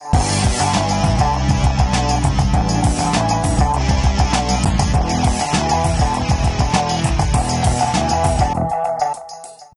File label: intro music; ambience